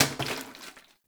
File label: Janitor Plastic Bottle Water Drop; Warframe; Ambience; NineteenNinetyNine